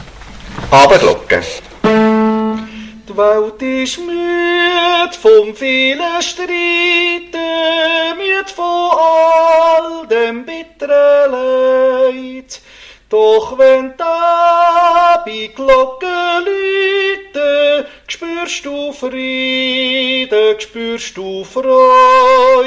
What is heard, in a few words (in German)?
Tenor